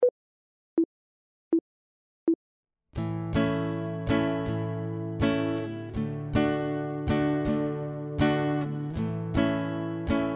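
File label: chord, guitar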